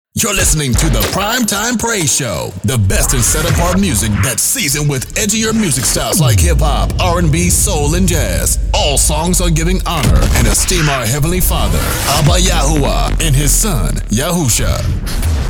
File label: Praise Countdown; Selah Prime